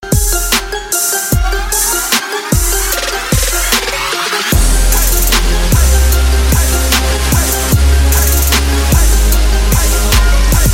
Bell

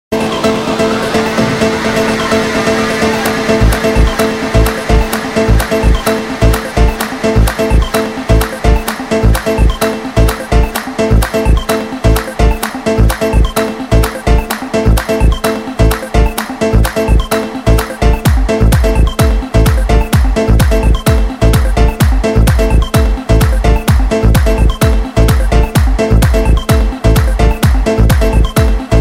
Arp

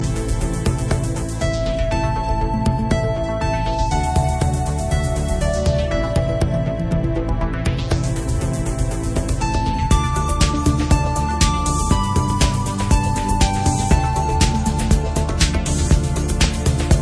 Synthesizer Music

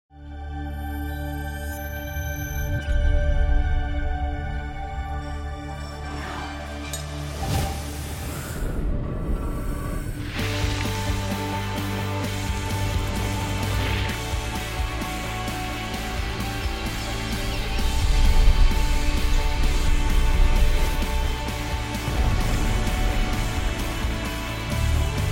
dolby atmos music

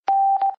Beep Beep

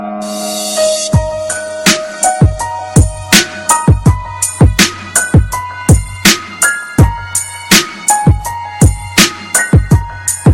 Bass

